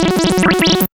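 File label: synth sequence; E-Lab; X Static Goldmine 1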